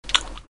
Suck; Lewd SFX

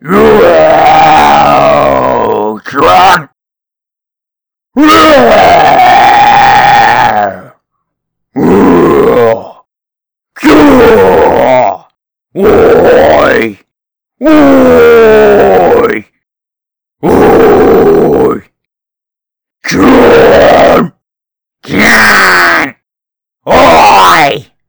Bugbear Roars

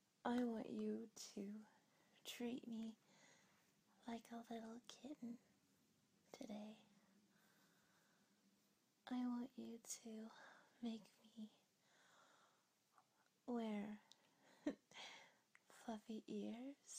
meows, purrrs, giggles; F4M; petplay; kittenplay; improv; Treat Me Like A Kitten; it's not a audio without giggle

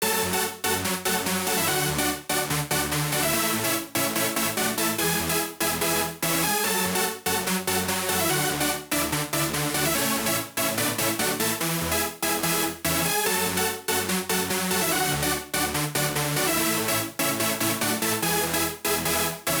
main melo, 145BPM; Pony Fan Music Remix Stems

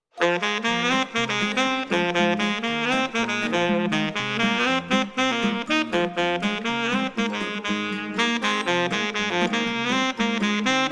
Sax Cover

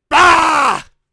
Bugbear Roaring

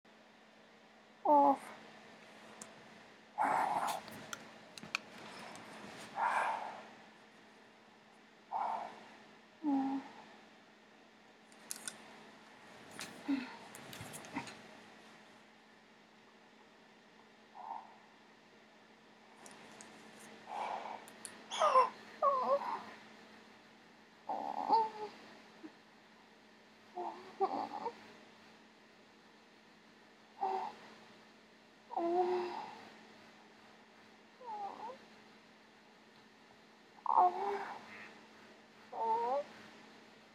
Gagging, Girly Noises, Whimpers; F4A; Gagged

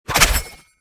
attack projectile; Li Li Shadowpaw Sound Effects; Li Li Heroes of the Storm Responses; warcraft

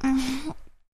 Moan

